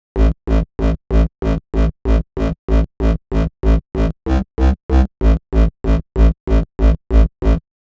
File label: TEK BASS